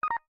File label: Cam Stop